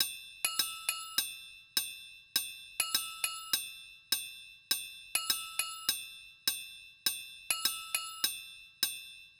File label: Gamelon Bells, 102 Fmin